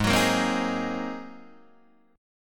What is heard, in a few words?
G sharp 9th, Guitar, Open D